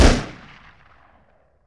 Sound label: fire, weapons; gesource; d5k